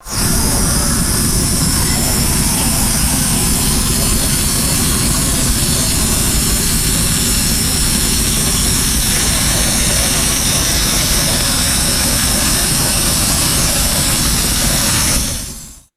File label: constant roaring geothermal steam vent; liquid mud